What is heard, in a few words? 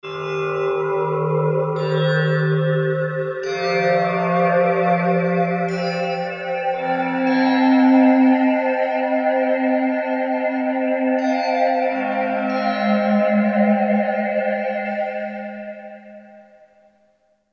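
Bowed Cymbal, Korg MSC-10S Ethnic; BowedCymbl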